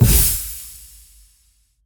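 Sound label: symbol replace; riches of midgard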